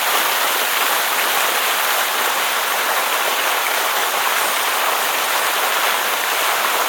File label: Fountain Loop; kult